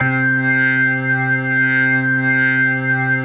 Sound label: HAMM C3